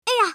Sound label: die female